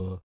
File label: Swomp